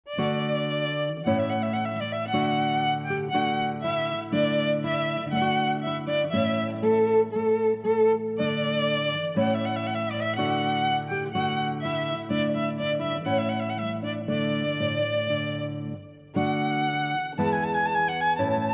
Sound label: Trumpet